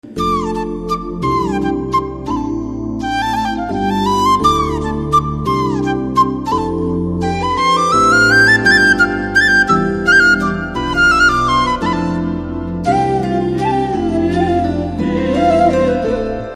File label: Flute BGM Ringtone